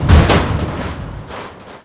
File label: destroy boom; WAR